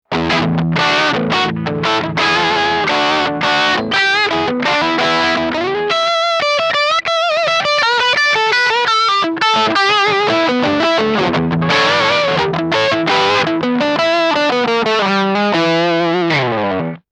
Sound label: Maxon FL9 Wah-like Tone Normal